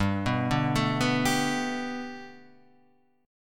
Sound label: G Major Add 9th, Gadd9 chord; arpeggiated; JGuitar